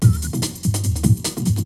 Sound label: BREAKBEATS, TECHNO